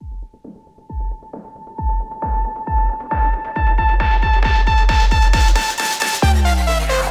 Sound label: Intro, 135 bpm; fashion police squad beta; Parole Ball Hackerman Intro